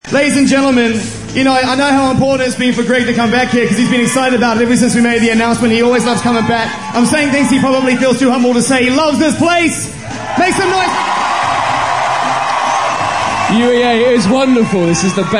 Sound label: chant on radio